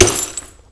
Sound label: Headshot